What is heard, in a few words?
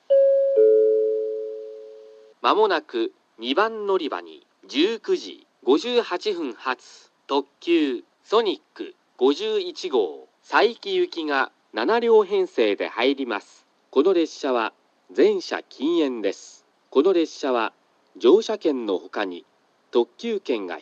kurosaki sonic saiki; 2番のりば予告放送（ソニック51号 佐伯行き）